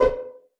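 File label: pizzicato F#2 PP, STRING PIZZ; Sound On Sound - Peter Siedleczek's Orchestra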